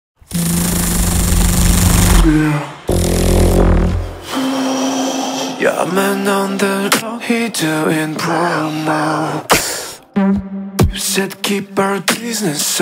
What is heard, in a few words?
beatbox cover